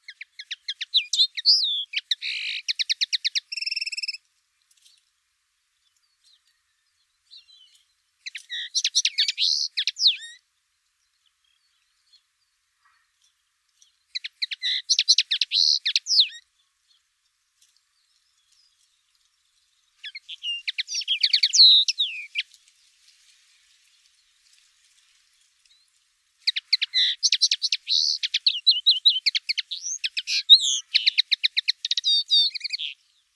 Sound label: linnet